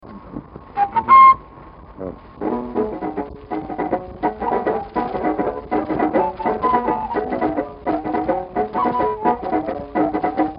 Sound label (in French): musique flutes; Expédition